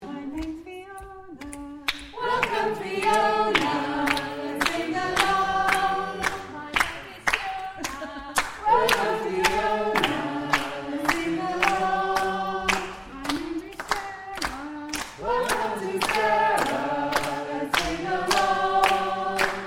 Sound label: lets sing along